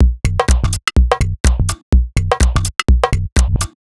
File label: C 125bpm, House Drumloops; 90 s Sample CDs; Zero-G House Fabrik Drumloops; ZG Drumloops